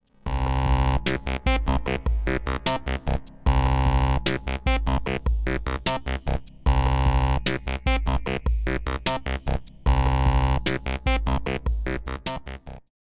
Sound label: WT OSC; Rossum EM Control Forge